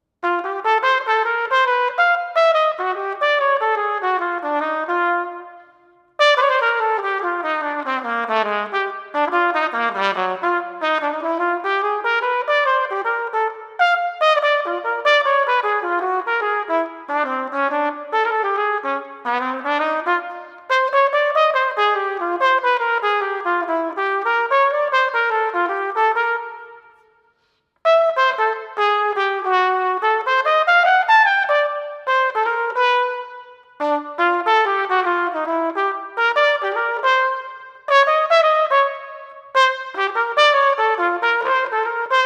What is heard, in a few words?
Senior Jazz Trumpet